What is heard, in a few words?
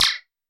Zing, Roland SH-32 Drumset